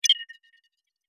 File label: Modular UI Solo Beeps